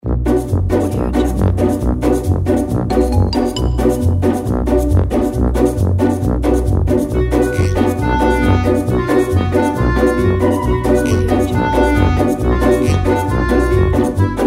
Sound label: the comedy funny music